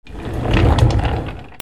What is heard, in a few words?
OFFICE